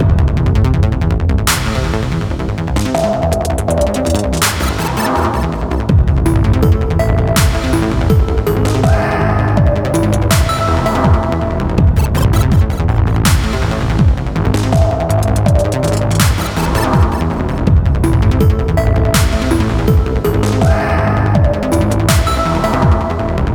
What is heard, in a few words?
Interface Title Screen Loop; Lastfight